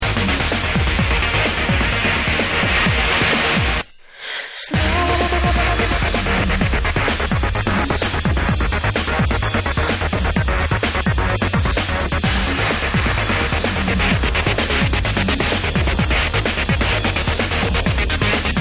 Breaks Mix

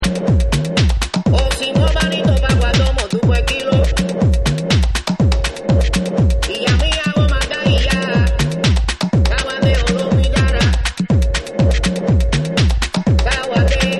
CUBAN E FUSION

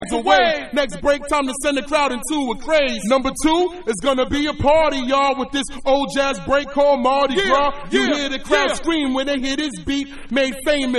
Acappella

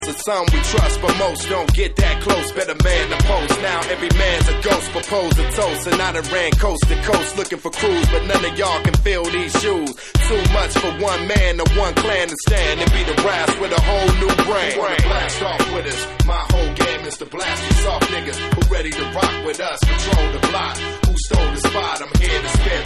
Vocal

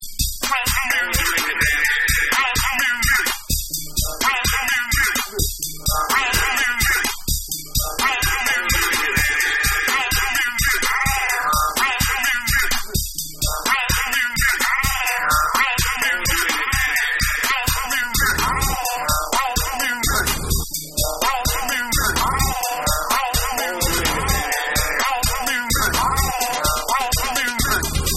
Basic 909

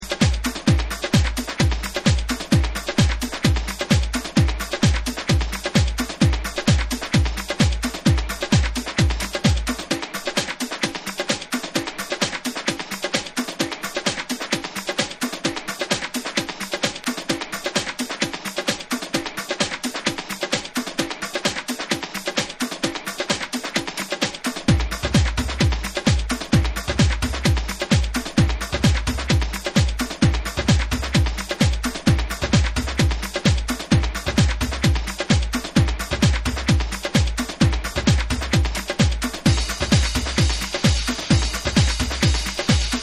Drums

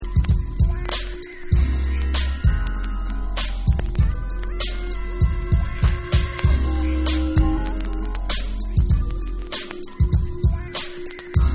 Abstract Instrumental

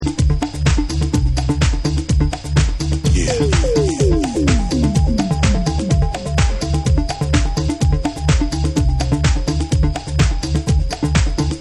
Village Beats

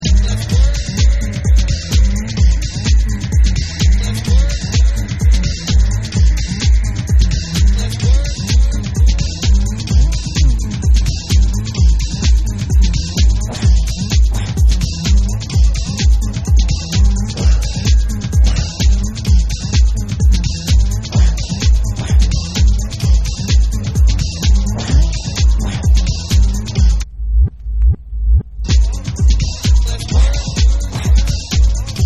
Deep Instrumental

